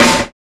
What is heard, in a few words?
SNR, Cheez Snrs